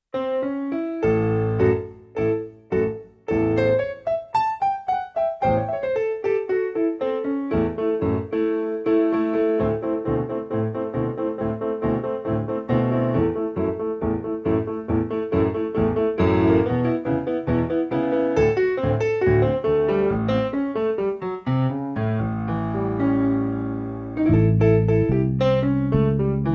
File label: pc3 rock